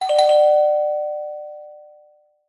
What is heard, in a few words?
household doorbell ring fast; zapsplat